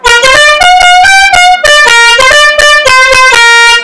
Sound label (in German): melodie o-susanna, Hupen-Soundeffects